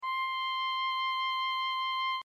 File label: Oboe; Real Samples; oboe&horh